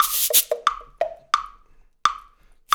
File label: Roots Reggae; Sounds Good - Roots Reggae